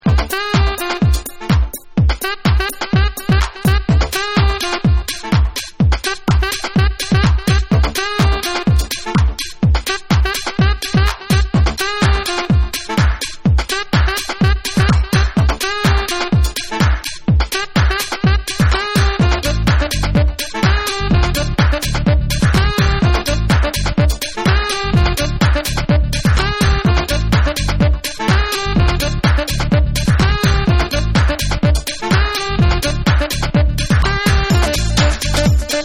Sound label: SAX